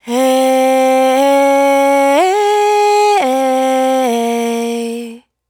riff 115 C#, Vocal, Dry Vocals; Raw Vocals; Slate Digital Omnivox Vocal Sample Pack

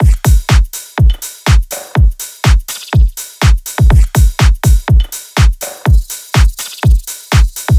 drums 123, Full Drum Loops; Slate; SEISMIC Drum Sample Pack

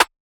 snare yellow, Hip-Hop Sample Pack, Drum One Shots; Drums; Slate; CAICOS Hip-Hop Sample Pack

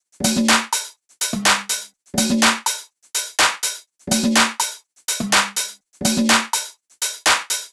tops 124, Drum Sample Pack, Loops, Top Loops; SD SEISMIC; Slate

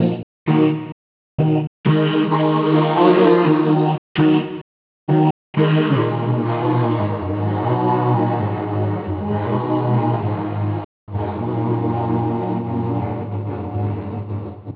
songstarter 130 Ebm, Pop; Slate; SD PASADENA Pop Sample Pack

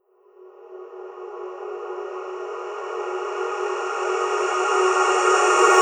reverse, vocal sample

